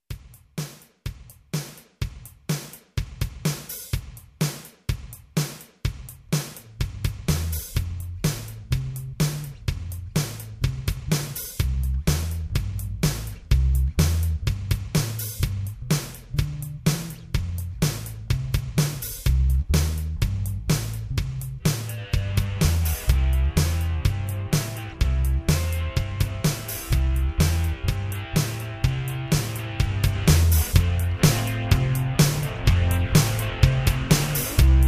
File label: jam; 3 chords 12 bars blues progression